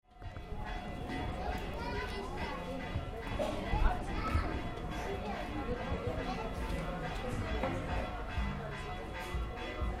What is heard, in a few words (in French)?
cloches, san ildefonso, mexico y queretaro